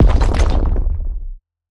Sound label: Crystal Up; Game rip; MiSide